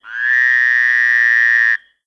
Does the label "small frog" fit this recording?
Yes